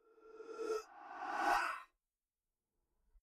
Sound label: "Huh haaa" Wet Preverb